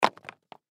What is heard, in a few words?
High Heel Shoes Drop; High Heel Shoes Set Drop Cement; Objects; Blastwave; Foley